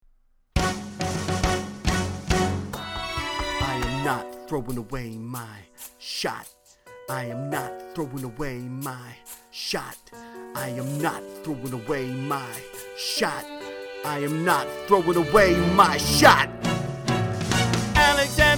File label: tenor